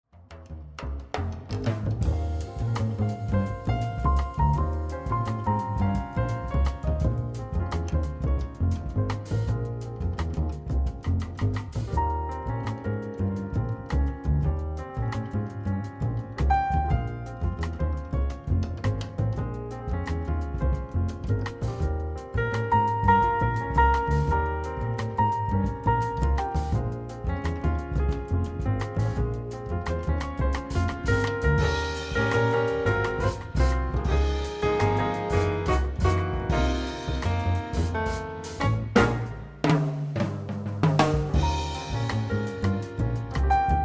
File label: jazz trio